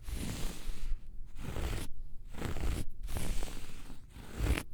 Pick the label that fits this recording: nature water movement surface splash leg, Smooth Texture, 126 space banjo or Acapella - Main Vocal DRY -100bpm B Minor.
Smooth Texture